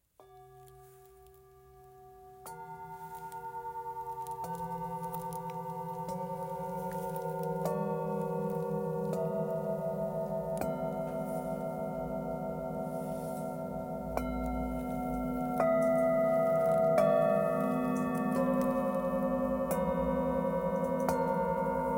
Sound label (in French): Set harmonie; Sets de 7 Bols chantants 7 Châkrâs